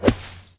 iron hit bunker

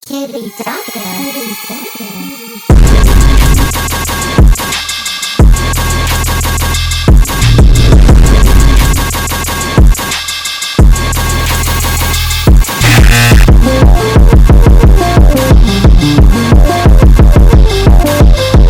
METAL PIPE CORE

